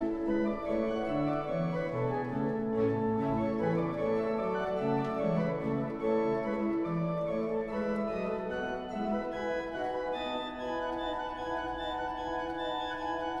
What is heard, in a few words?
Bourree Organ conc; Handel